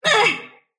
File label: sylph pain; dota; dark willow